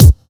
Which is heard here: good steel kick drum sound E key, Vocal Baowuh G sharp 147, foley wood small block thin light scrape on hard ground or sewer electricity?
good steel kick drum sound E key